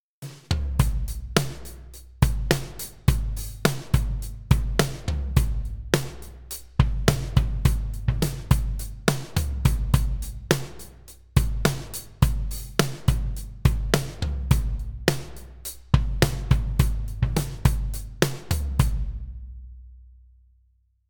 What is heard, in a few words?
Drums Min Att Rel